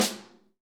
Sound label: AMB KIT; Real Mega Drums